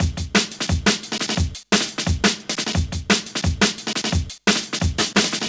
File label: Breaks, 175; Drum&Bass; EZ Rollers